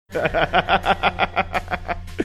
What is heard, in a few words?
laughing